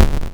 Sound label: explosion